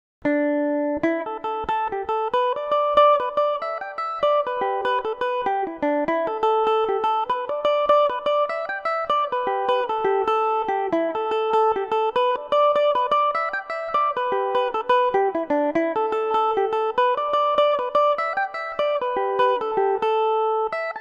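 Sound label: A dorian revelation, Irish mandolin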